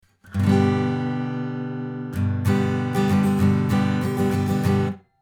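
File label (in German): Tascam Model24 A Git Schoeps Mk4 FX Chorus, akustische Gitarre; Gain 3 Uhr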